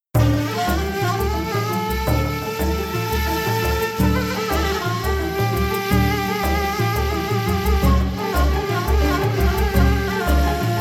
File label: Bulgarian Voice